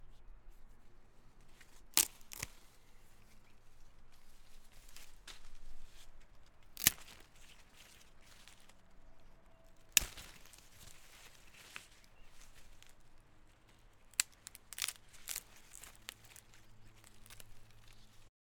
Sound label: Tree Branches